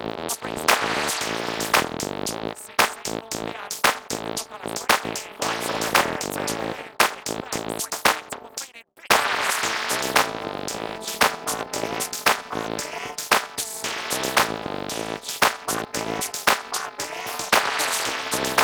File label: percussive